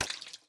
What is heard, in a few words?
gib splat